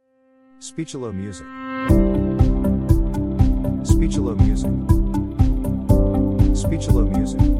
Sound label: upbeat